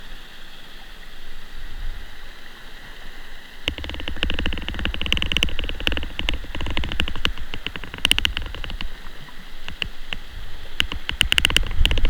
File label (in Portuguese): Sons rotadores clicks, golfinho rotador